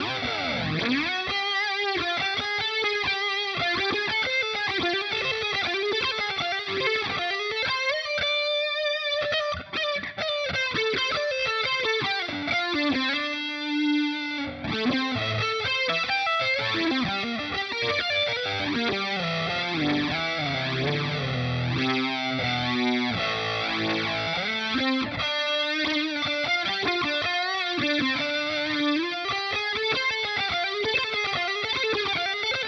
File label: Mooer GE300 Lite Marshall Modulation Stereo